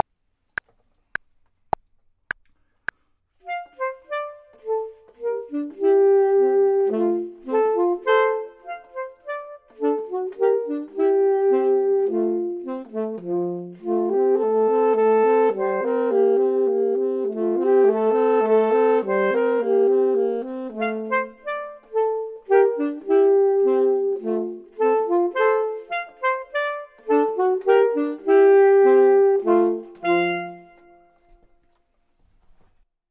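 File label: both, Saxophone and Clarinet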